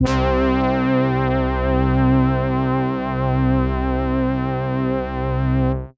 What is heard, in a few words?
SYNTH Mega Brazzzzzzzzz; Bassie & Adriaan